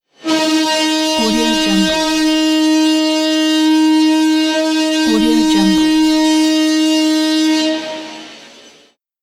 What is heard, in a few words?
horn blast